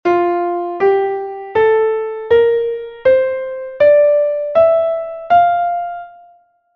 scale of f; Essentials of Music Theory: Elementary

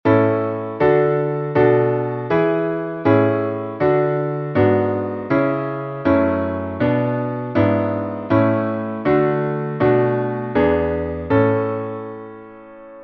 dominant secondary seventh; Essentials of Music Theory: Elementary

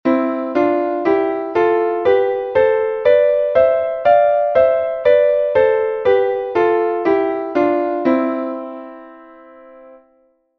thirds; Essentials of Music Theory: Elementary

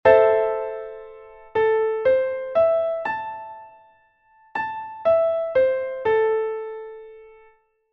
minor triad; Essentials of Music Theory: Elementary

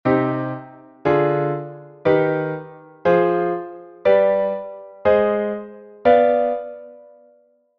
minor mode; Essentials of Music Theory: Elementary

